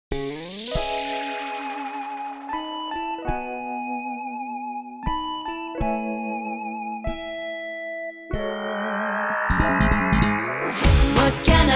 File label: English